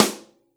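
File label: DRY snare; Ensoniq ZR-76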